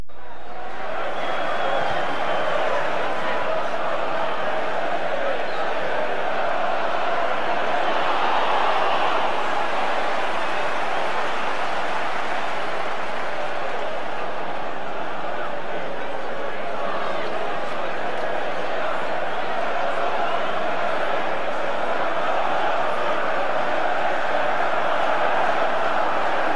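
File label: crowd noise loop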